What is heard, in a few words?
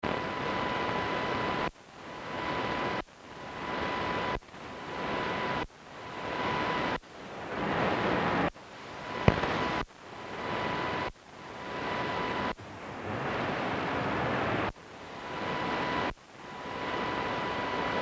5kHz Steps Noise; Radio2